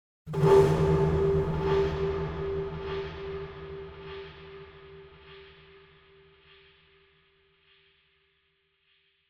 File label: Impact Long Echo Wooden Hit; Hollywood Edge; sound designer's toolkit v2